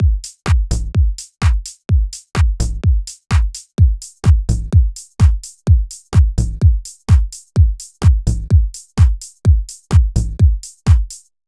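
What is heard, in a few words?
drum loop multiband FX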